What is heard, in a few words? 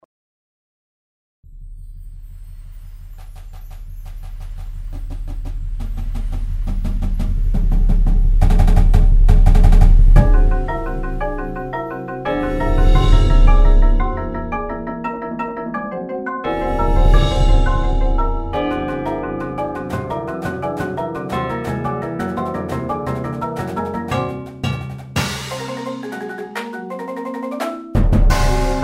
Storm